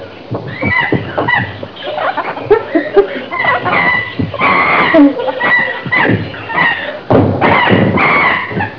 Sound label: monkeys group; svencoop